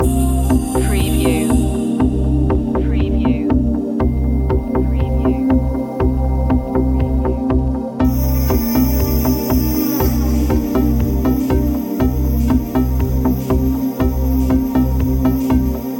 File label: downtempo sunset loop